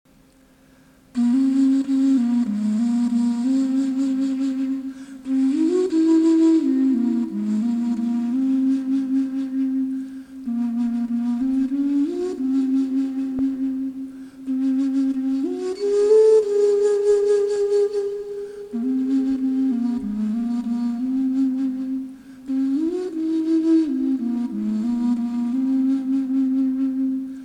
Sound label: zhu tong xun; piaoyao